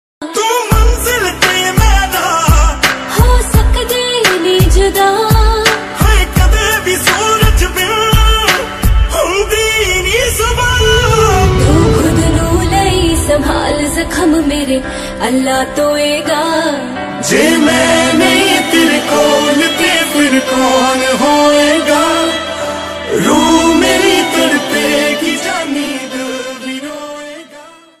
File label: Punjabi Sad Song Ringtone